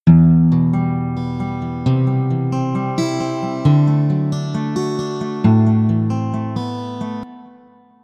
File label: guitar